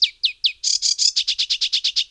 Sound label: warbler